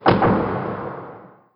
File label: Fliphit; tempest 4000